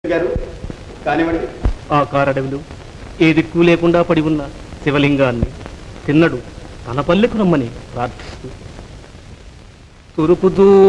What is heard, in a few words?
comedy song; ntr tenali ramakrishna